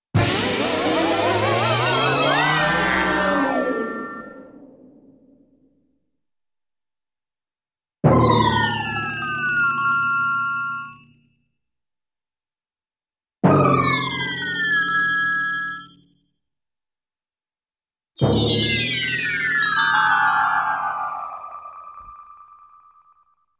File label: Cymbal Crash w-Ascending Tones - Bass Drum Hit w-descend; Hollywood Edge Cartoon Trax